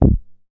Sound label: Moog; leo-sounds